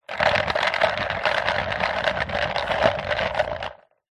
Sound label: industrial cart dolly small wooden wheels roll concrete ground short